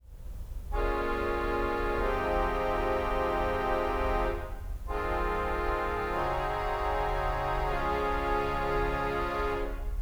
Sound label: violin cellos